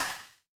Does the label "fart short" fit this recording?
No